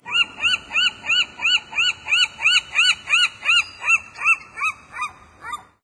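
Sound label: rural seagull; random amb sfx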